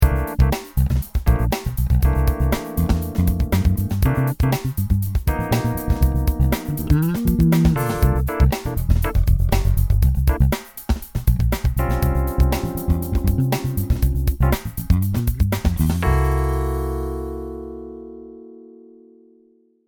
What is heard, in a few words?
Muting; Fclef 5 string Vintage ‘60